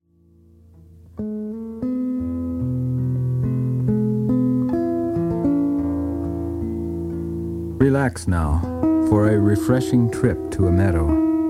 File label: Meadow